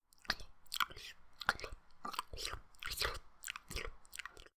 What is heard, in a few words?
Ghost Licking